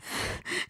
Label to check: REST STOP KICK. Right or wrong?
Wrong